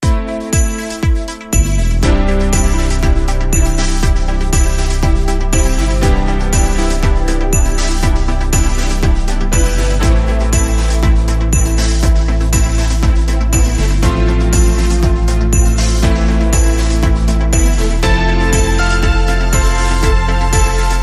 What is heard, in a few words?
Sad Christmas, Rhythm